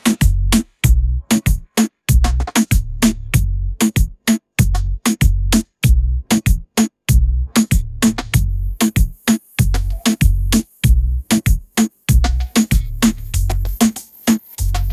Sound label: tropical sunrise reggaeton groove